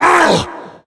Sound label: tank pain; Left 4 Dead; Source Engine